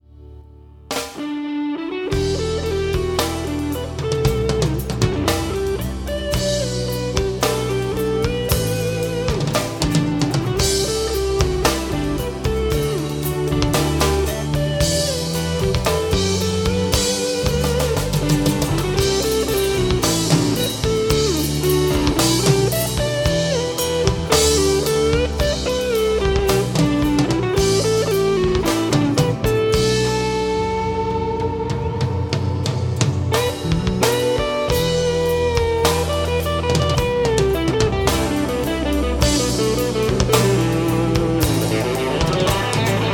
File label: acoustic